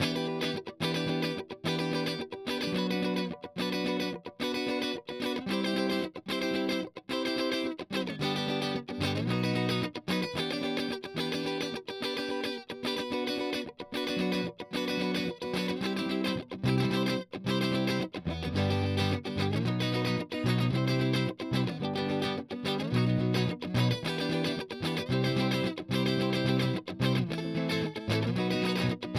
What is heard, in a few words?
Strum